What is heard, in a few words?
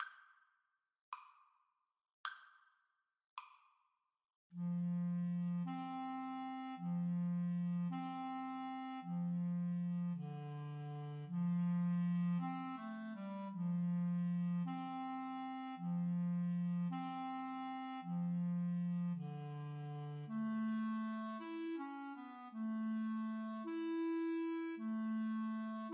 Clarinet Duet